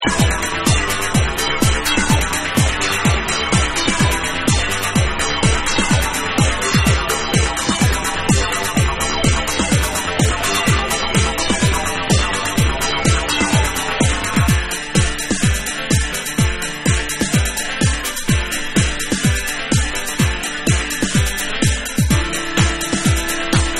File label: Bass Mix